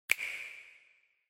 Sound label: Finger Snap Foley Reverb